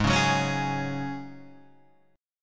G sharp 7th Suspended 4th G sharp, Guitar DADGAD

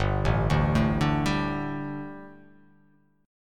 G Suspended 2nd G, down, Gsus2 Chord; arpeggiated; JGuitar

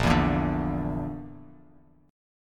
A sharp Major Flat 5th, Bass Standard; strummed; JGuitar

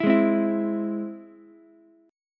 E Augmented, Ukulele; 9,8,8,7; strummed

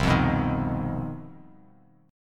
C Minor Major 7th Double Flat 5th chord; strummed; JGuitar

